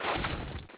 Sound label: knife deploy